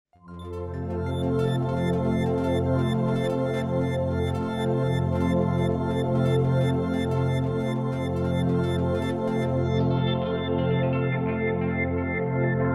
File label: FM Rain, yamaha sy77 pads and textures